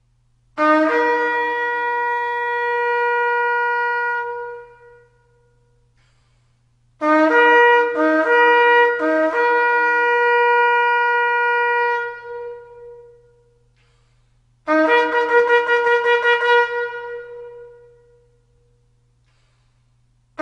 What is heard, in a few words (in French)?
du chofar de kippour